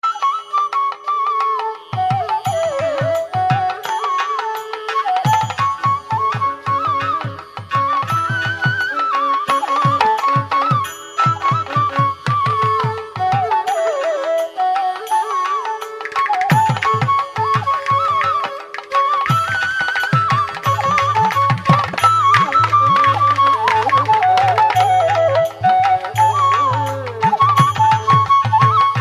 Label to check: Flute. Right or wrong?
Right